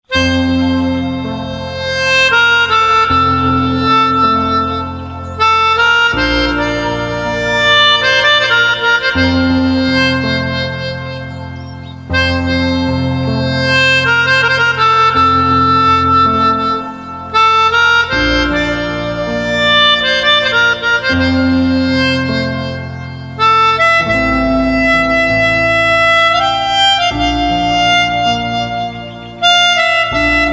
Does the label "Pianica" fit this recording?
Yes